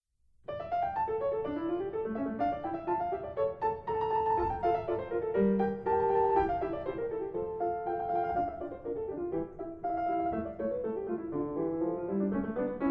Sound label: Sonata in D minor; Scarlatti